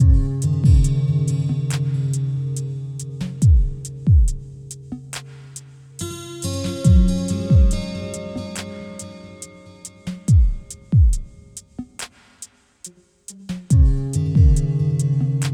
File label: chilling funk 432 hz